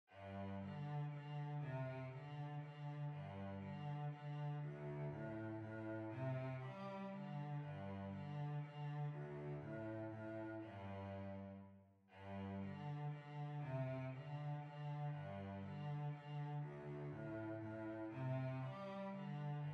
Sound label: Violoncello